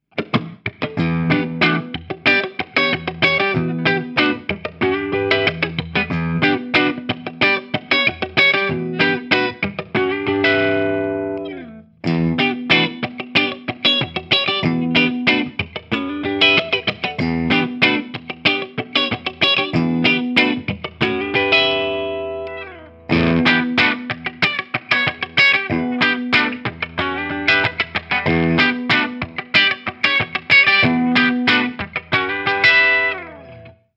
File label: Clean Voice 3 Way Switch